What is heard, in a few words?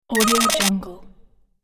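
sci-fi robo glitch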